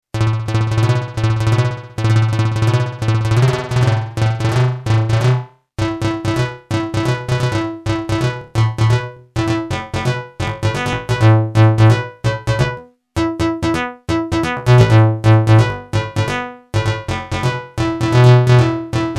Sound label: Delay